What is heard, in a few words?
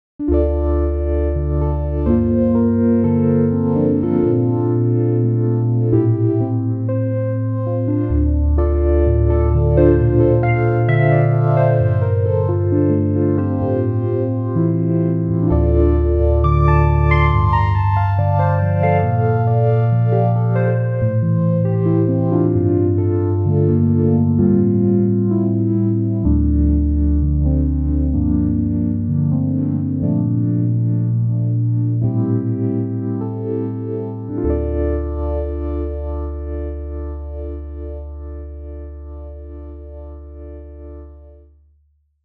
Pianet N MonoPoly